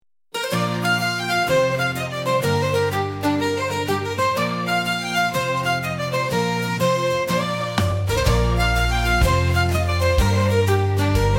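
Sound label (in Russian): highland echoes, volynki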